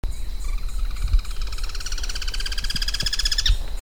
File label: Guira guira rattle